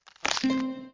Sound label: swing; Golf Blitz 1.12